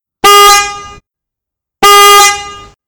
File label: Two Tones, twin horn